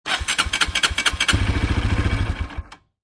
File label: Motorcycle Dirt Bike Engine Turning Over; Auto, Car, Motorcycle, Motorbike: Motor turning over.